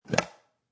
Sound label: The sound of tupperware being opened.; Kitchen; Household